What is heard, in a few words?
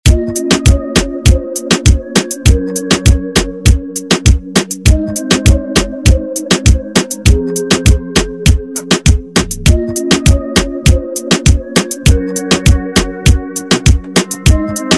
Afro Rton Redrum